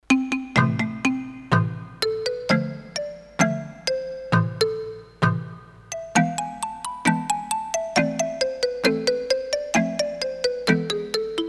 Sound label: Tropical Mallet